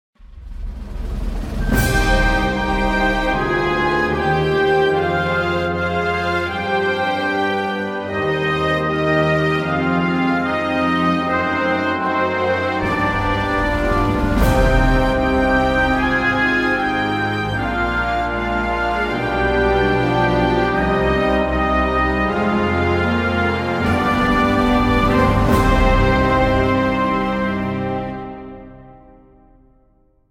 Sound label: Combat Anthem; just cause 3 gamerip